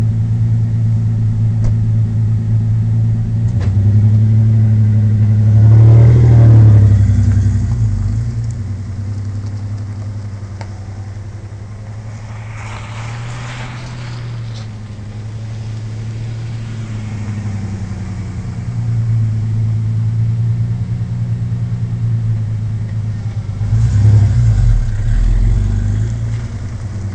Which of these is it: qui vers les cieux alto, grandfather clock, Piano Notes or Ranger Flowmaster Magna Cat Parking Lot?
Ranger Flowmaster Magna Cat Parking Lot